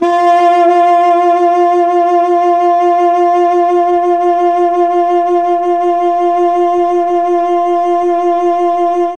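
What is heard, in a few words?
F3 tenor sax, mellotron; CHMB TNR SAX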